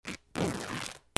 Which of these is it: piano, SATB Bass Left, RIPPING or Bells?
RIPPING